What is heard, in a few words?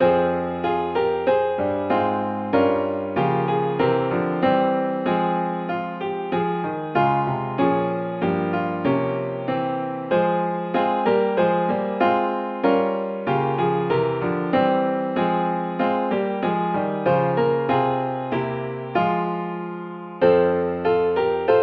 accomp; hymnary